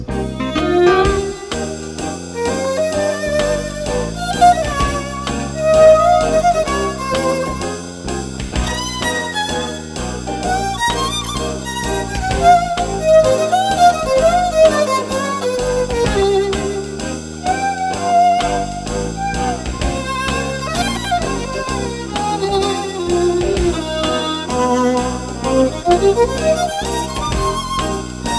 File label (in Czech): housle; Starej pán